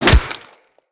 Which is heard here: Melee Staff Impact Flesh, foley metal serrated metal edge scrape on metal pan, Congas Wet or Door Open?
Melee Staff Impact Flesh